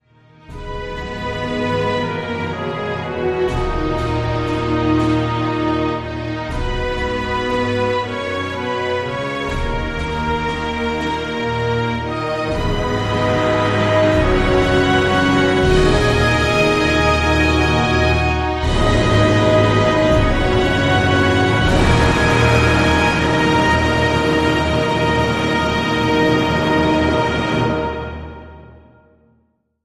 No Choir

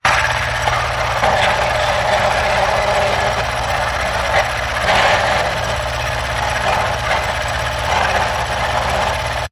Film Projector Dark Presence

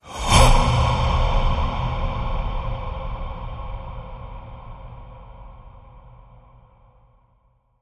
Inhale Exhale Low Breath Ping

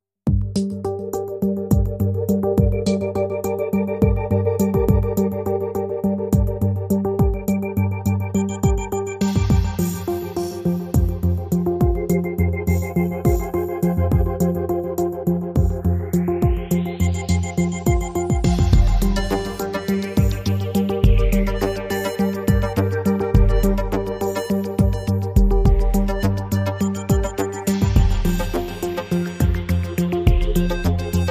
Lounge

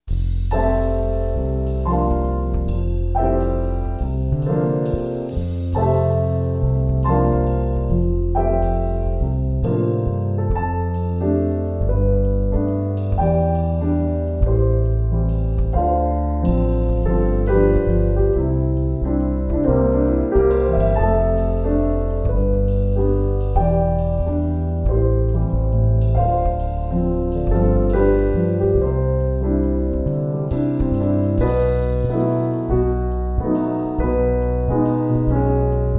Waltz, With Trio

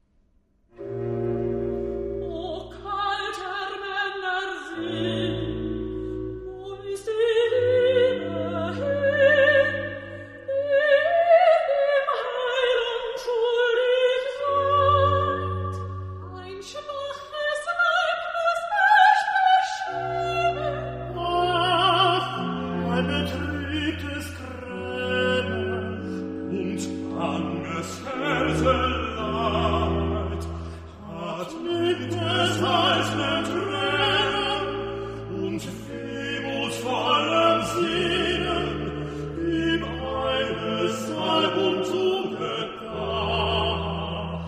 Easter Oratorio Recitativo; O kalter Manner Sinn; BWV249; Johann Sebastian Bach (PD)

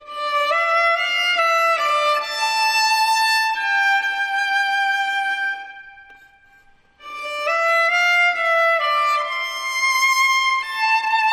Violin

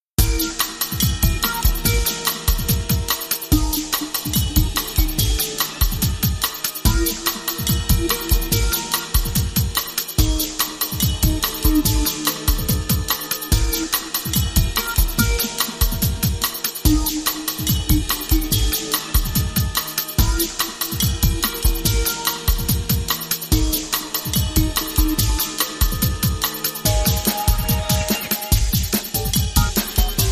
No Melody

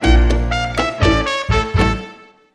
Hit

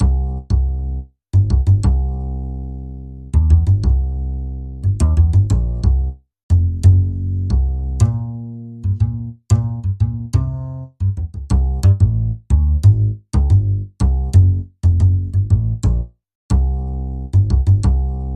Bass

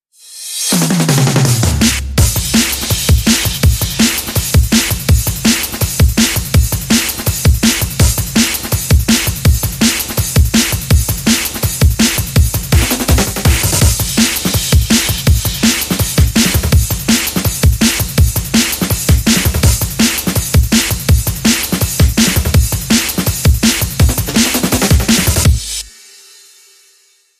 Nu Metal Beat

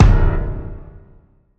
Piano Hit

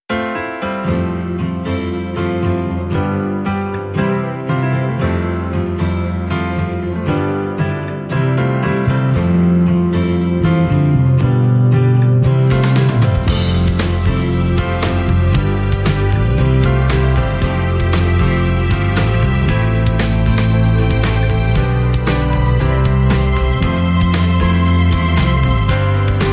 No Electric Guitar

